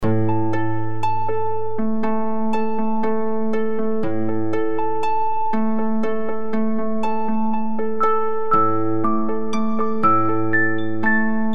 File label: Gentle Closure Looping